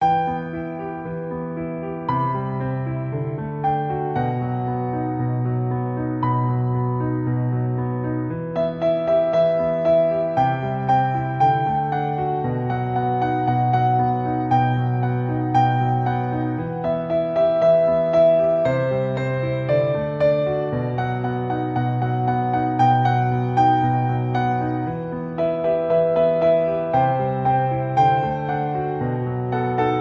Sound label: Piano Cover